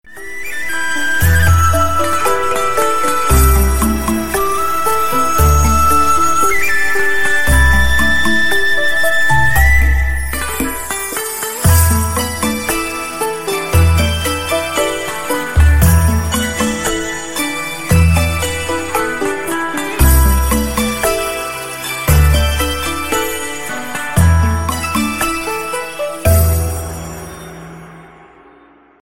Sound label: heaven ride ringtone, nokia